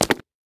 cement land; Golden Crayon Sounds

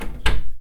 door open; Golden Crayon Sounds

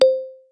group chat; Golden Crayon Sounds

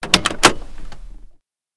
door open; Golden Crayon Sounds

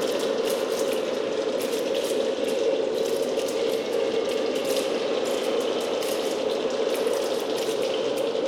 eerie; Golden Crayon Sounds

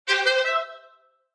Fanfare, Alert Tones; iOS Tones; Classic

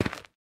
dirt step; Golden Crayon Sounds

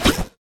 grappling hook yank; Golden Crayon Sounds

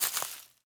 weed step; Golden Crayon Sounds

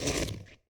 snow step; Golden Crayon Sounds

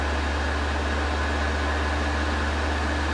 fan; Golden Crayon Sounds

